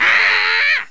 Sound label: ahh; The SoundBlaster Multi Media Experience vol 4